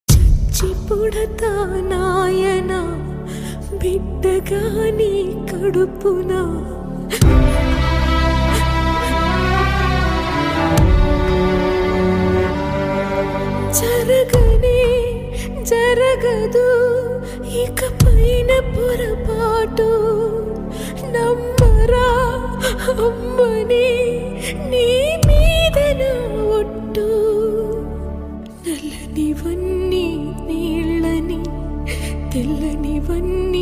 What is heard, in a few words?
sad bgm ringtone; chatrapathi; prabhas